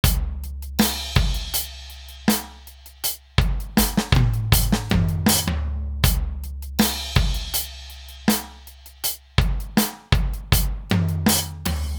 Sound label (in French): batterie clean